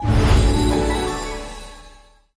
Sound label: sticky Wild Re Spin; fairyhansel